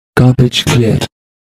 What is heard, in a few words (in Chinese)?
清理垃圾; LockChime; 电脑